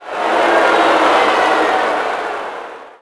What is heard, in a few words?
NEG Reaction,Crowd; Cars; XBOX